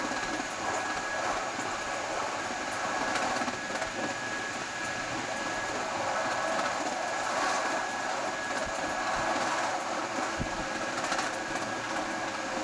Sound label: run, Train; OpenBVE